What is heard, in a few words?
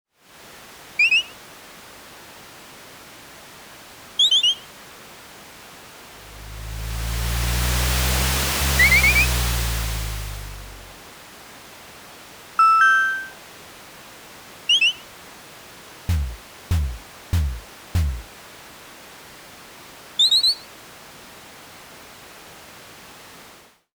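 ambience morning suburb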